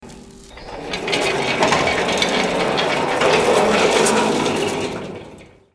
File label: gate chains open; WarhammerQuest